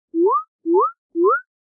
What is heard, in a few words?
Temptation